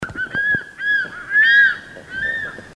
Crested Serpent Eagle, birdcall

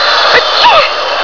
sneeze

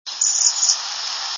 robin immature buzzy feeding sounds; birdsongs

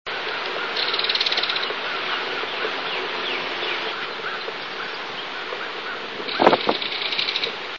kingfisher; birdsongs

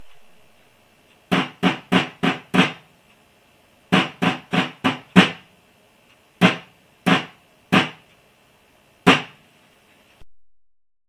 commence firing

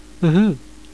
Woohoo

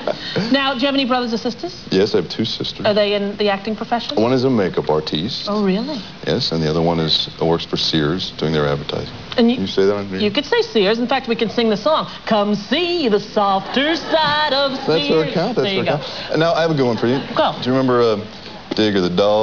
singing

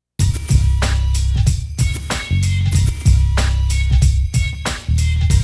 house

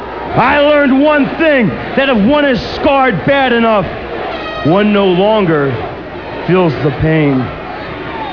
pain

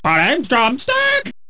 drumstick

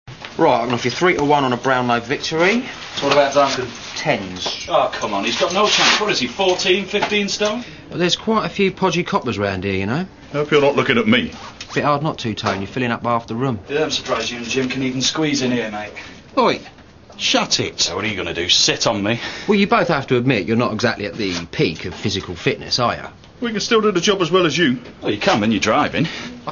locker

